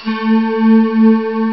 d50 synth choir 4', Amiga Soundtracker Sample Packs